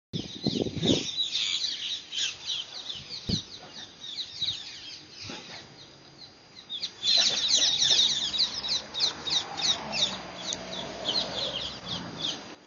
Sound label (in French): Perruches bruxelloises aux Etangs d Ixelles